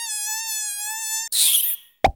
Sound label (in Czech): moucha; Silcom; ZELVY